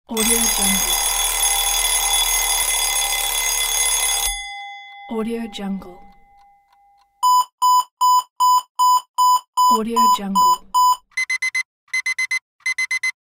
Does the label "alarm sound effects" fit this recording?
Yes